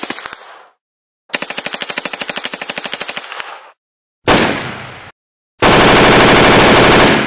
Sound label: HK MP5 Sound Effects